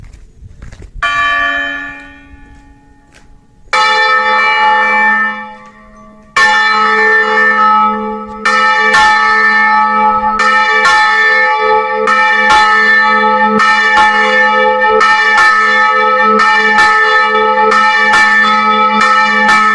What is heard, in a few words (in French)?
Volee tournante manuelle